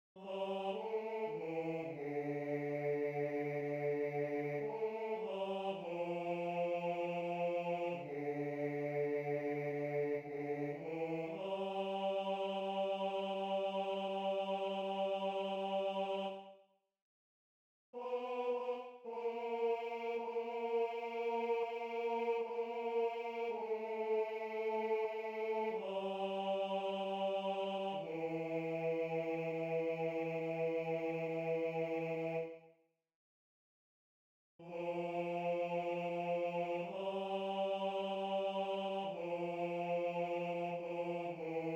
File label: bass